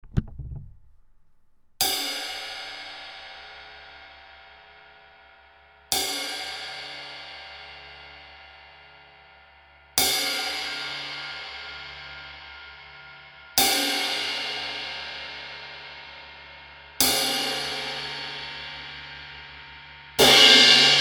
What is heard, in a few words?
Ride Jazz 19; Galata; Amedia